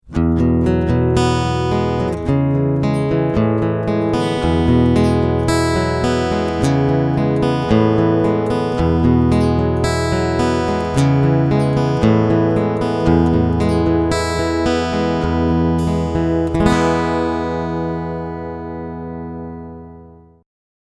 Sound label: major picking